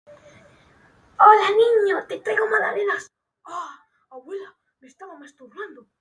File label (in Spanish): abuela ahhhhhhh